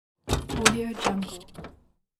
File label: closing the door